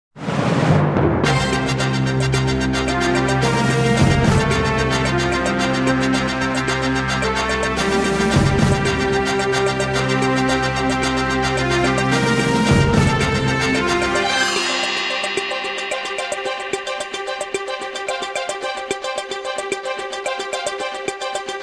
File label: break news short, theme music